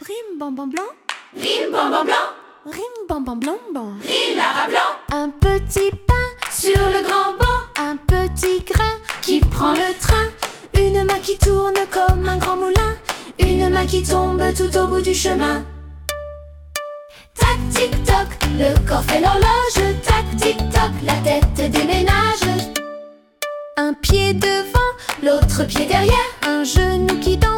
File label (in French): tac tic toc, comptine rythmique